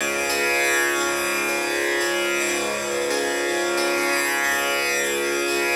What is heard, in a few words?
TAMBOURAS, World Combo